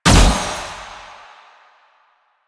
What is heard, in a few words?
Kunai Proj Explosion; Warframe; Weapons; Choir